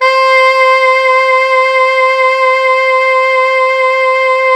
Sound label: Pop Brass; Best Service ProSamples vol.19; AKAI